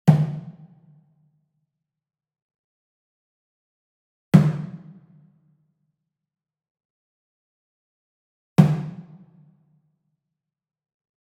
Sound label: Wood Percussion Wood Drum Mounted Hit Slap Medium; boom library; Cinematic Strikes Construction Kit